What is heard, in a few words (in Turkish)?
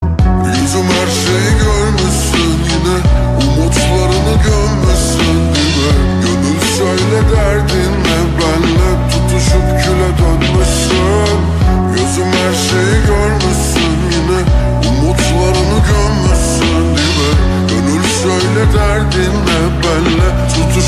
Slowed